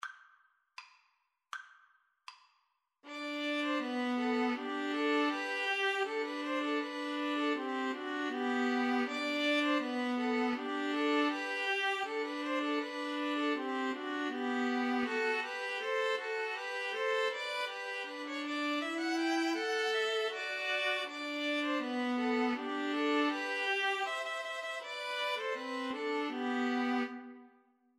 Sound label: deck the halls, String trio